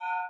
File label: hit purple; zombie riot; btd